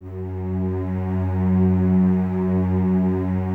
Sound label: Cellos, String Ensembles; F#2; Miroslav Vitous; LINKS ORCH